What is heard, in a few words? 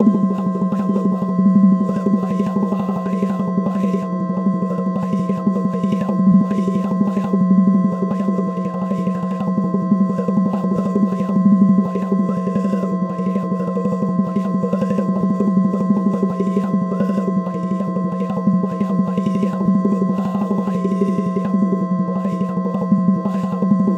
Arpeggio